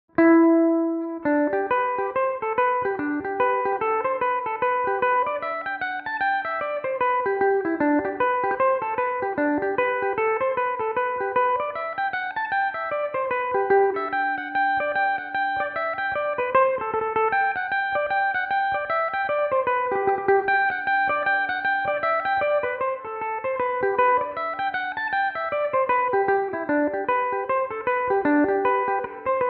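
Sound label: G major belmuse, irish mandolin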